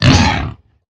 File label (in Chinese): 受伤声; 疣猪兽